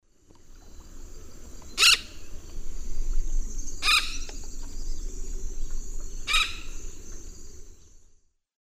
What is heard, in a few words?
Orthopsitta manilata, flight calls; S. America; Eastern Lowlands